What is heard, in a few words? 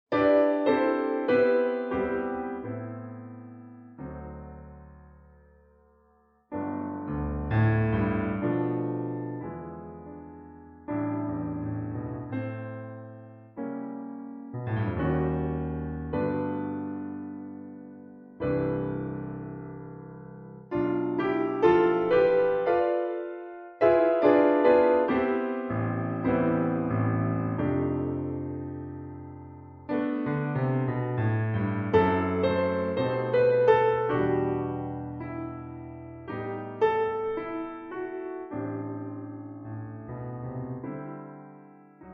flute piano